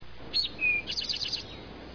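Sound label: rufous-sided towhee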